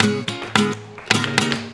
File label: GUIT BREAKS; Best Service; World Colours